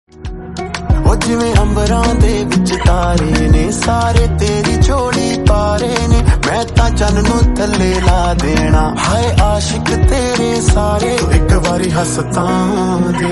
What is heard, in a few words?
new love romantic ringtone